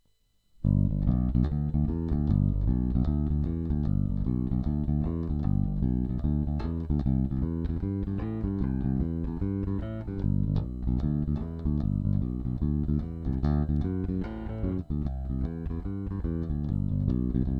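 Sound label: aria pro alnico parallel; aria pro alnico16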